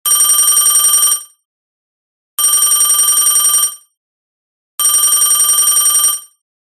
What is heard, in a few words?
vintage telephone ringtone sound